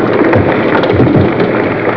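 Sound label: earthquake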